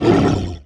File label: Hit